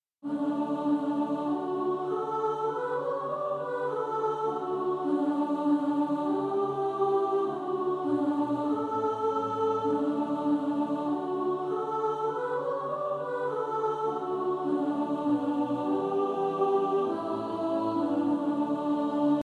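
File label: soprano; Praise him all ye little children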